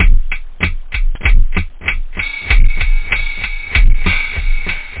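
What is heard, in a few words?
loop percussion; da capo vol1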